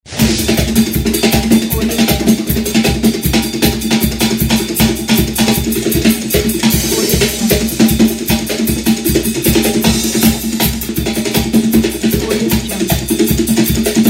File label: armenian percussion ident